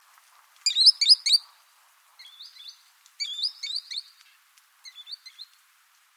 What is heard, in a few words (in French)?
chevalier cul blanc